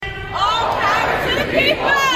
all power to the people chant, philadelphia; at malcolm x park philadelphia pa

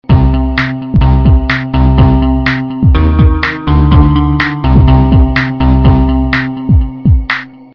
funeral march remix

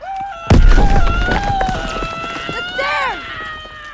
scream; cod

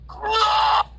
yell

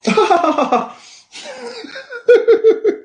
hahaha

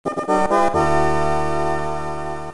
fanfare

